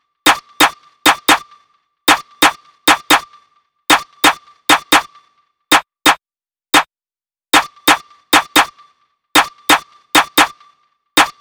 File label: Drum Loop - 132 BPM Snare; Loop Stems; Cymatics; Vanquished Drum Loop